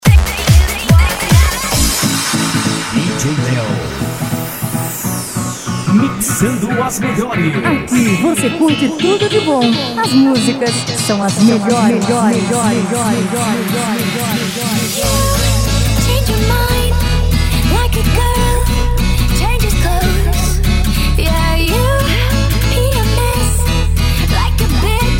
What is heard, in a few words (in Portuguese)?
electro funk e electro dance remix